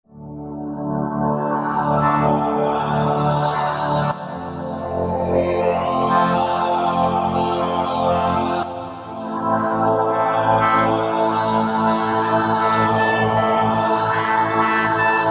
Chorale Pad, Roland V-Synth; Factory Patches

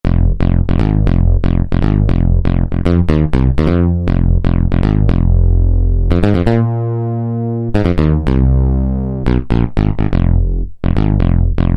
BA Starred, Yamaha TG77; Factory Presets

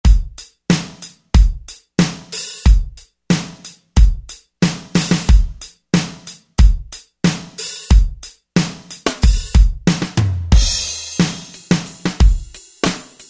Drums

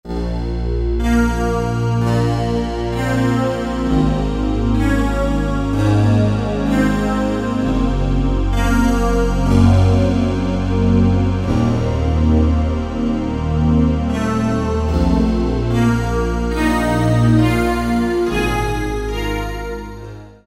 Wire Pad, Korg 05RW

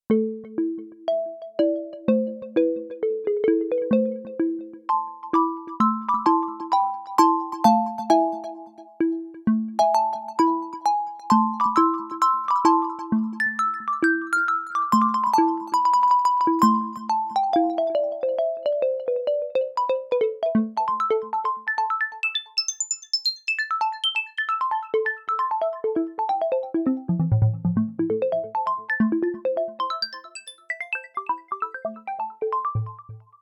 Glockenspiel

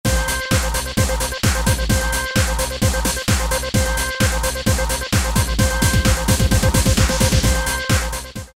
Chicago House, Roland D2

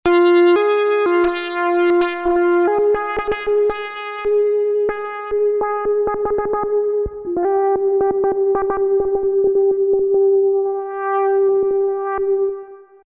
Hip 2b Square, Roland V-Synth; Factory Patches

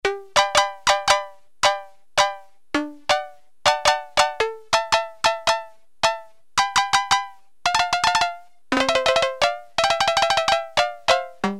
STEEL DRUM, Casio CZ-1000

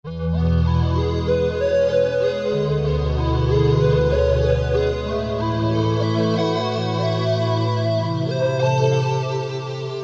2 voices; Korg MSC-12S Synth 3

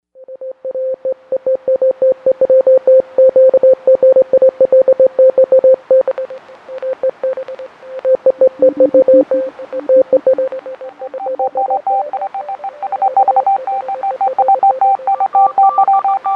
Distress Call, Novation UltraNova; Factory Patches

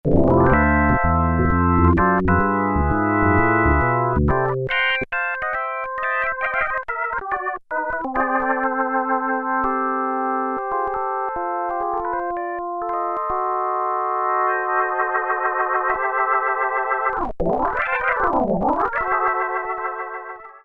Org Rotary Ribon, Roland JP-8000; Factory Patches

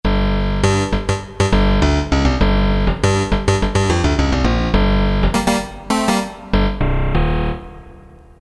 Syn Bass, Roland MT-32

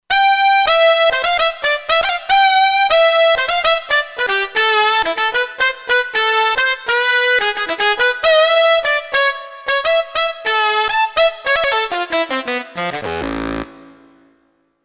Brt Saxy Lead, Kurzweil K2500X; Factory Programs

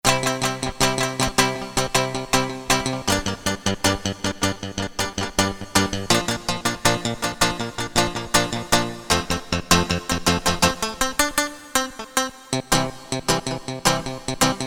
Pop Guitar; Korg DSS-1; Guitars

